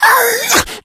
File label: rage, infected, male